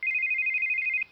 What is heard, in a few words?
motorola razr v3 ringtones classic